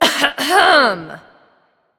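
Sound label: clear throat, female; smite; voicepack; hera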